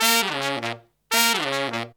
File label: horns, 160 bpm; 90 s Sample CDs; Zero-G; Phantom Horns